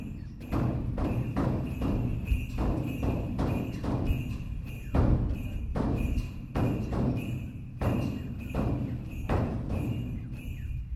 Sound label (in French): Chine dans le temple de Songzanlin